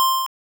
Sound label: Smoke Screen